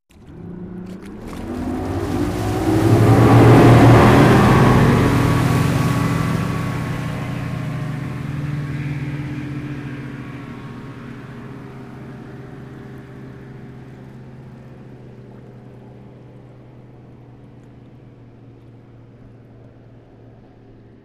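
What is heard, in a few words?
motorboat sailing away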